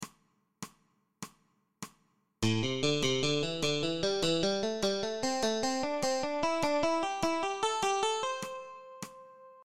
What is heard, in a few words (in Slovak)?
osminove trioly nahor priklepy uvolnenia; cvicenie